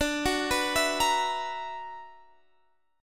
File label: B-Minor Major 11th